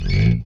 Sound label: Bass Chapman Stick; Roland LCDP02 Guitar and Bass